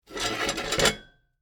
Table Slide Forward, Foley, Table, Slide, Forward, Metal, Movement; Slides; BlastWave